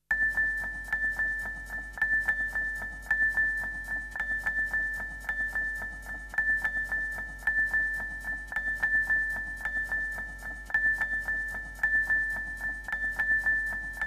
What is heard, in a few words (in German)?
klavierpling